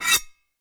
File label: knife